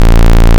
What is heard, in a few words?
OB SQ, Synths; Ableton Live 12 Lite; Core Library